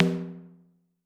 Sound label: perc, Drum Machines, Clavia Nord-Drum; 300 Drum Machines